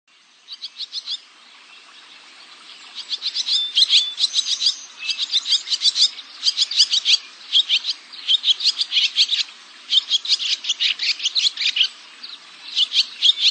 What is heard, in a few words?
Barn Swallow; Birds